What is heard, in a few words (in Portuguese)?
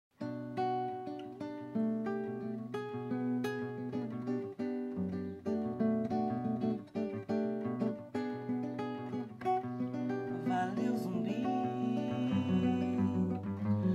Kizomba